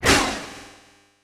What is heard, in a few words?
Beam Sword Whiff; Super Smash Bros